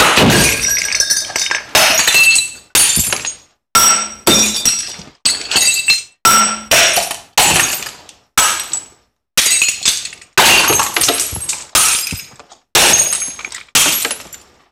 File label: glass breaking